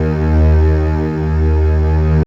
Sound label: Strng; Revista CD Expert 50; PCGamer50